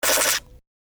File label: Future Taser Weapon, science fiction sound effect; star wars / trek style games; Andrew Fleming